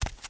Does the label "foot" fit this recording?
Yes